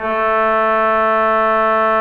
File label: TUBA; E-MU Producer Series Vol. 5; 3-D Audio Collection; 3D Multis; 3D Sprint Select 1